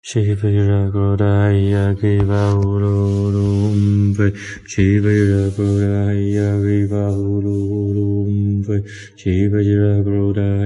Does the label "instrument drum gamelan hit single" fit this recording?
No